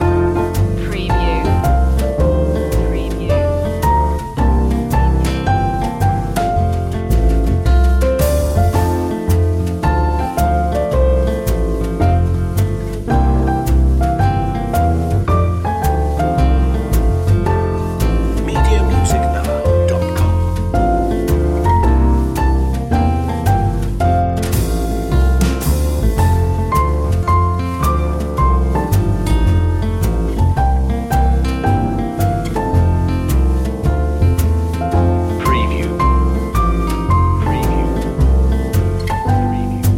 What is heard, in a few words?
go tell it on a mountain jazz